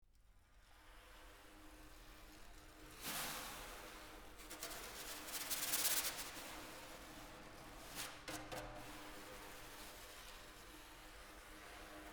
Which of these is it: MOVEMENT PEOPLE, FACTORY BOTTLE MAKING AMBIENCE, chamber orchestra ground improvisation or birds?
chamber orchestra ground improvisation